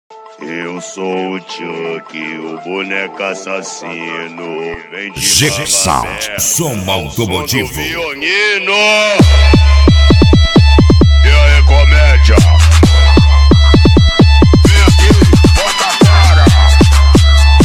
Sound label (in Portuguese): som automotivo